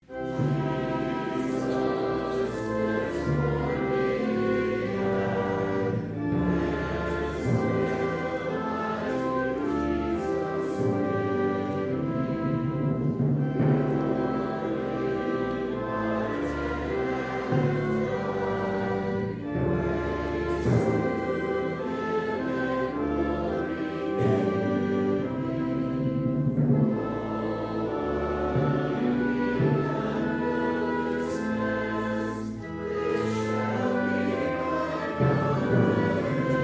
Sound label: trumpet